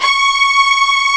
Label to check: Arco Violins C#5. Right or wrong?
Right